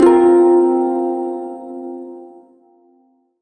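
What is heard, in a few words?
Prime Shawzin Pentatonic Minor Chord, Music; Warframe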